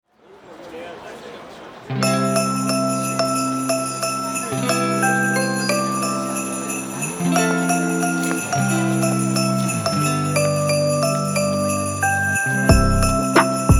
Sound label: jingle bells christmas hip hop